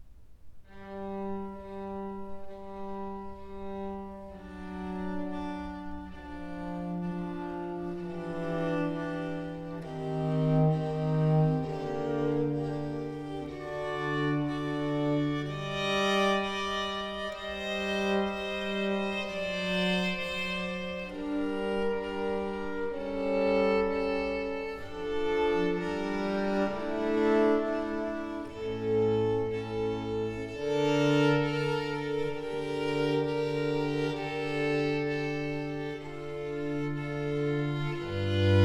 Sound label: Sonate 1 in C major - 3. Adagio; Arcangelo Corelli; Corelli - Complete Works; Klassika